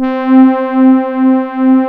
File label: DX POLY PAD